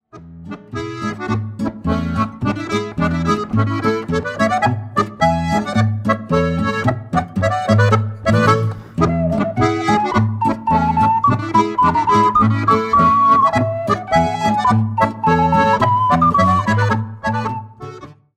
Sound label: Polka; Saalfeldner